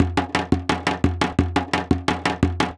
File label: PRCLP, Percussion Loops; neuro; Stanza; Drums